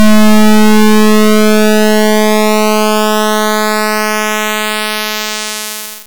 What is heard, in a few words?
pulse width sweep